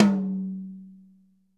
HITOM, drum

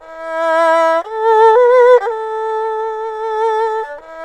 ERHU, 120; G; INIS; Opium

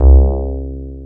C2, Bass Analog & Synth; Vintage; USB Soundscan vol.12; AKAI; 90s sample CD

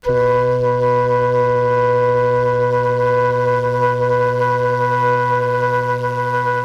FLUTE HUM B4; Saxophones; Greytsounds - Philip Wolfe David Crozier's Saxophones

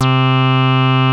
HITEC HI C3, ANALOG BASS; Music Store - Claudius Diemeter Schwerlos

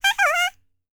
C ASIA, World Voices

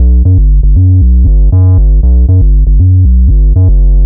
Synth Bass

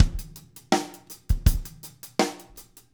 16TH QUICKY, Drumscores

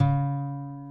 ACBASS C#4; Sonic Reality; American Heartland

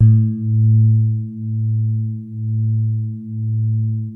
COND SOFT A1, E-PIANO; Music Store - Claudius Diemeter Schwerlos

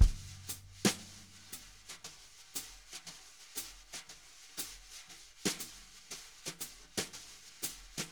jazz brush loops

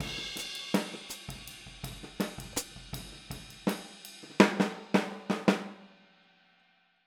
Boogaloo - Outro - Hi-Hat, 82 BPM; Sonic Foundry; Drums from the Big Room The Mixes

